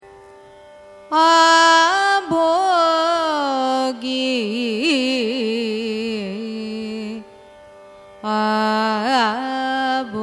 raga abhogi signature, carnatic music

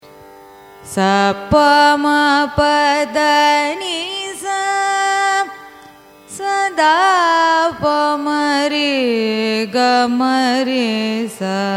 raga saranga arohanam avarohanam; carnatic music

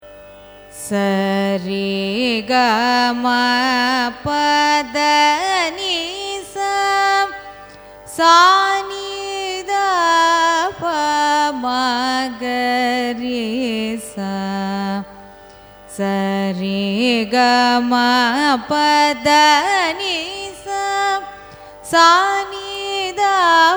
raga shankarabaranam arohanam avarohanam; carnatic music

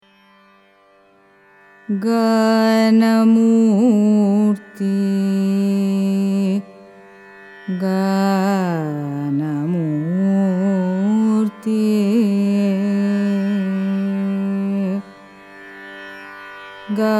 raga ganamoorthi, carnatic music

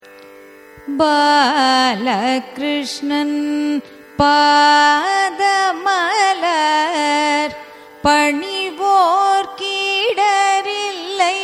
raga dhanyasi carnatic

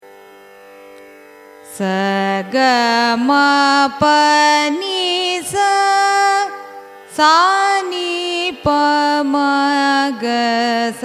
raga sumanesaranjani arohanam avarohanam; carnatic music

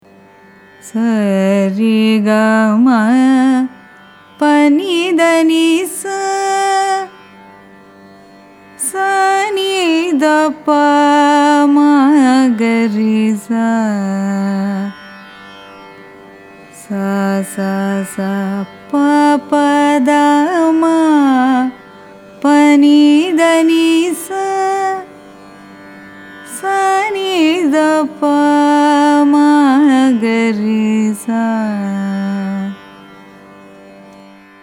raga huseni arohanam avarohanam; carnatic music

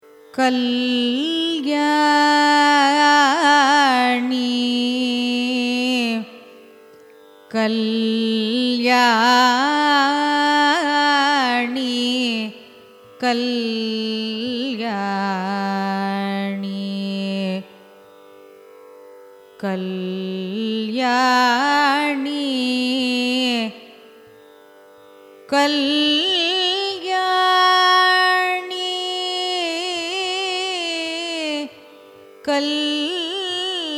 raga kalyani signature; carnatic music

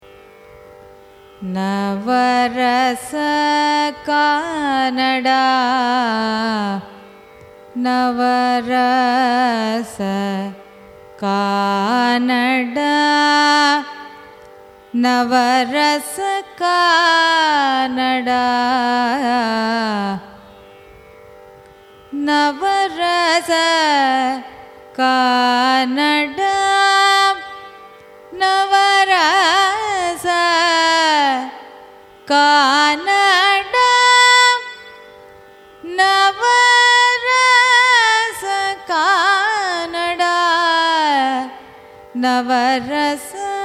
raga navarasa kanada signature, carnatic music

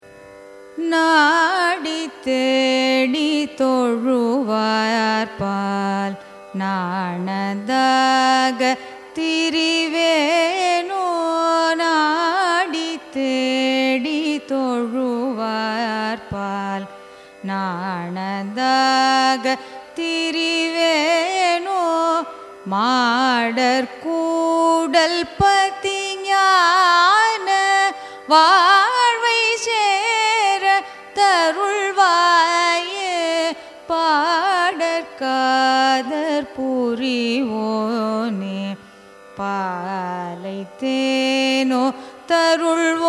raga bageshri carnatic; naadithedi

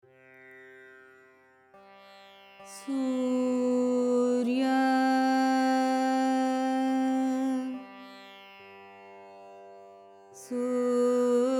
raga surya signature, carnatic music

